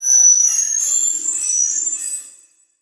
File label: Title Transition Crystal Shatter; Adobe; Production Element